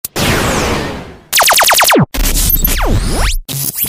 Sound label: transition